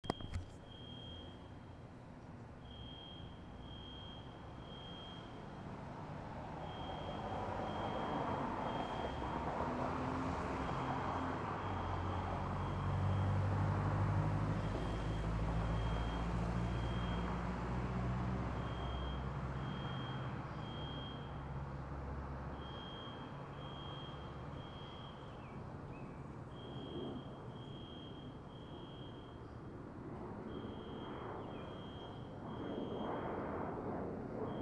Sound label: Weird Klaxon First Hill Seattle WA